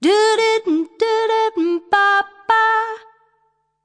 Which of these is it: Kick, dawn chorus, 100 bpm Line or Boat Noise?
100 bpm Line